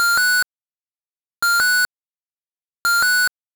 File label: finish success